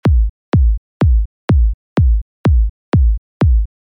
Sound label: groove